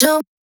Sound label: Vocal One Shot Female D